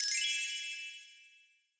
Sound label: coin; Skillzgaming; battle Mania Desktop